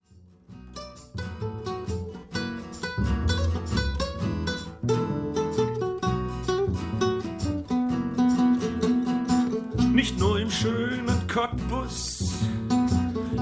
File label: Trio Blues